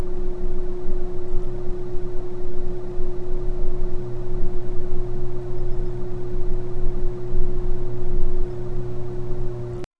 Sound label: quite underground warehou